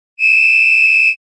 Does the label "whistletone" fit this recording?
Yes